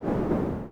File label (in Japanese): huton; LcdDem SFX